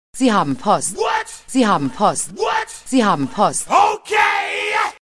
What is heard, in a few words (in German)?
SMS Ton